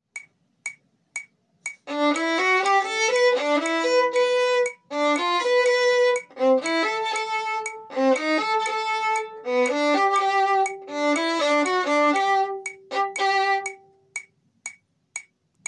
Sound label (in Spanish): Extracto A normal con metronomo